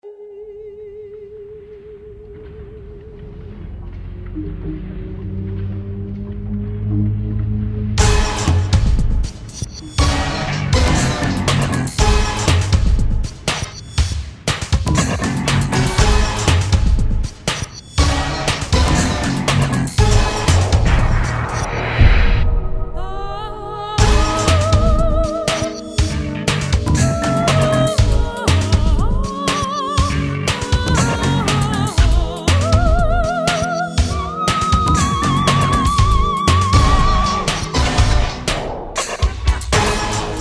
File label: Techno Opera; Fifth Element